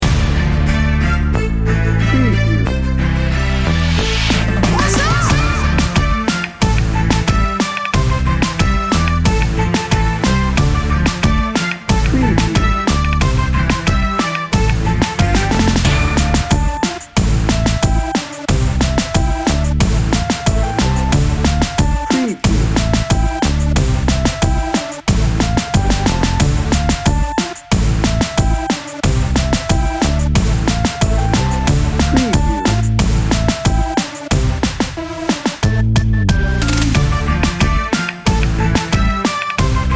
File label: reggaeton happy upbeat; Upbeat and bouncy. Featuring pulsing synth textures and a happy Reggaeton groove